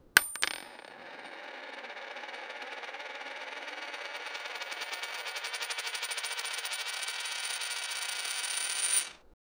coin spin

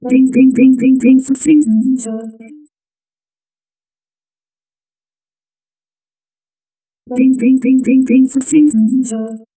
its thinking sound effect

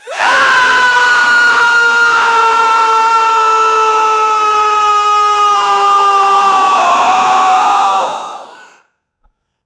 create an intense human v, anguished

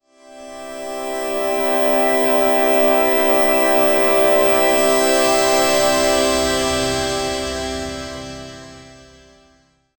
the pad synthesizer incre

